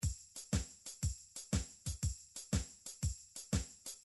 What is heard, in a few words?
drums irish, midi drums